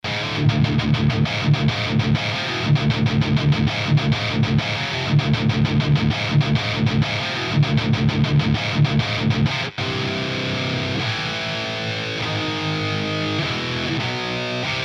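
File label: guitar; sinamp qc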